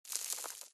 imp bullet lightballistic dirt debris

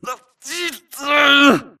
grunt Struggle; Titanfall 2

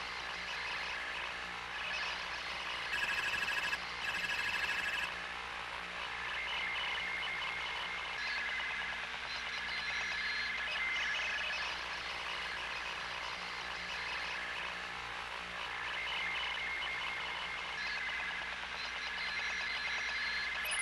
Computer Terminal; Blackwater; Titanfall 2

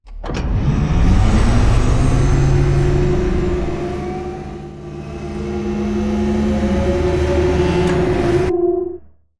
Giant Floor Panel Exit On Track Servo; Boomtown; Sideways Town; Titanfall 2

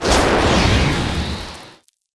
atlas dash close; Titanfall 2

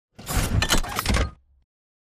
bt combat idle to backpedal; Titanfall 2

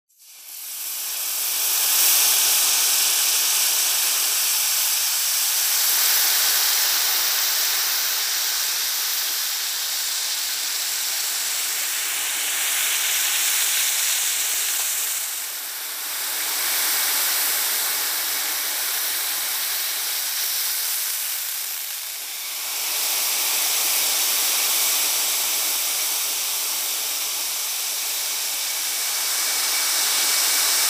wind edge sizzle; Titanfall 2

